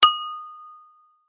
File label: Clink; blue archive